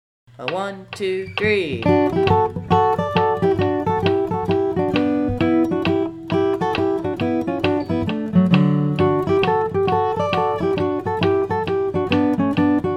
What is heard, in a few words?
flatpick guitar; 8th of january; flatpick guitar magazine